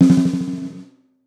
Brass Long Drag, snare; Drums; Ghosthack - Free Snares 2023; Blk Brass

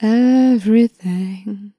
Everything, Vocals Dry

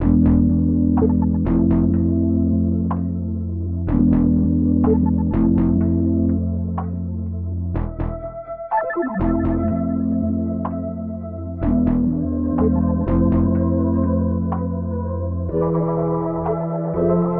One Over 124 BPM A Min; Cymatics; 2022 Melody Collection

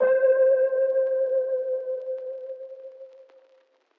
KEYS Jagged Edges (C); One Shots

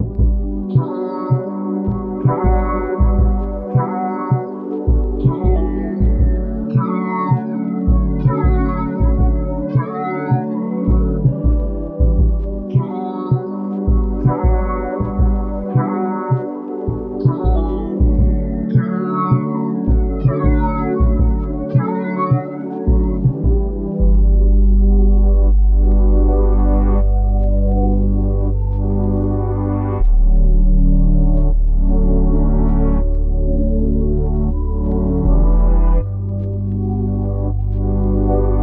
80 BPM D Maj, RnB; Cymatics; 2022 Melody Collection; RnB Pt 2

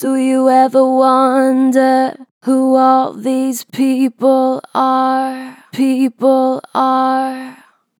Strong Vibrato, Natural Vibrato Strong, Vocals; Harmor Vocals

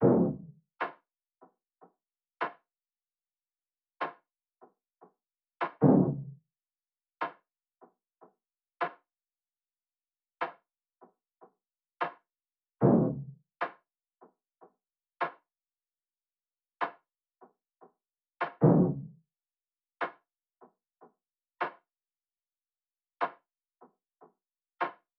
150 BPM C Min Percussion; RnB; Loop Stems; Cymatics; 2022 Melody Collection; RnB Pt 2; Love You So

